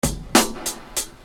Beat, Hip-Hop Street Beats

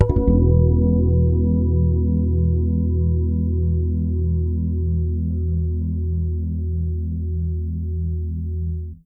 FRETCHORD E, PEDULLA BASS; Masterbits CLIMAX 7 - Bass

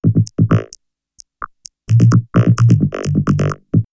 Vocoder Loop; X-Static Goldmine; VocoderLoops

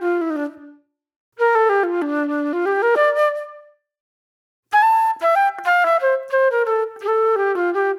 Reflective Flute

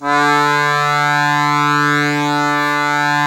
DIATO2 D1, Historical Instruments; USB Soundscan vol.23

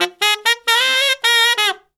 SAX SOLO; Zero-G; Phantom Horns

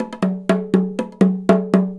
TIMBAL, Indian Traditions; USB Soundscan vol.45; AKAI

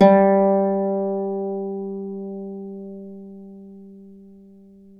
Celtic Harp

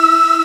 flt e, Flute, Chillout; Best Service ProSamples vol.36

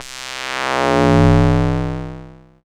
mad bass sweep; Jungle

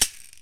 AFRICAN INST; OMI - Universe of Sounds

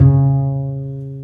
Cb Pizzicato, String; Cb Pizz 1; Roland - String Master Series

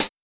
HiHat Closed

